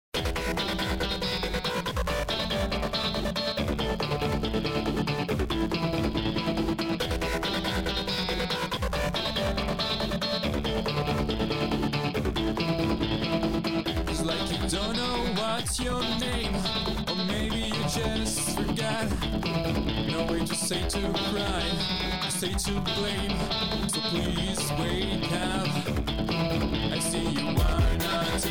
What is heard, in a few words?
SAD SONG